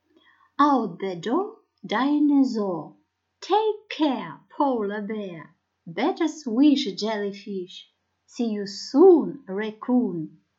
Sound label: Bye bye Song